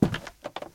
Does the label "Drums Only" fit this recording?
No